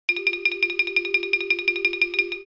Cartoon tiny walk

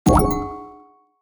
Casual mobile game menu ui confirm

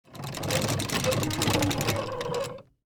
Catapult charging medieval crackling

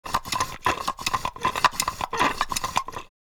Rusty throttle controller friction movement

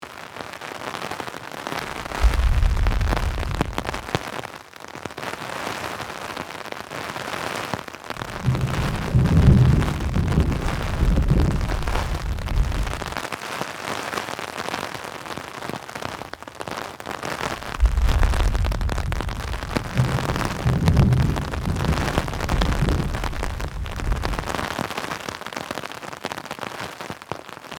Rain on umbrella with thunder loopable

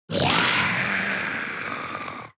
Animal creature monster attack

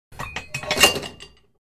Taking off a plate of a barbell 10 pound weight

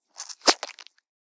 Hair gel paste rubbing in hands

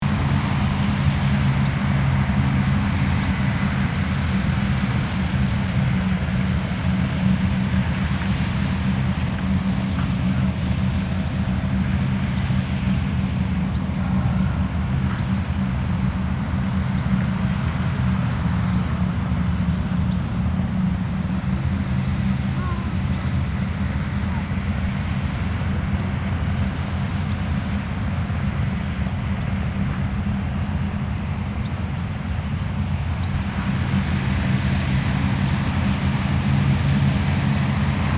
Rural farm tractor ambience loop

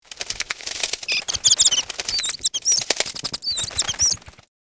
Bat wings flapping flight squeak

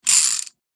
Revolver cylinder spin

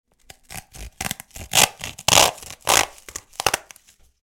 Carton box cutting with scissors

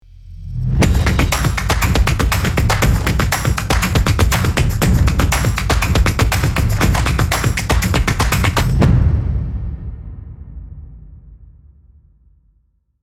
Hype percussion ident logo podcast; logo animation

